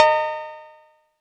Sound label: Cowbell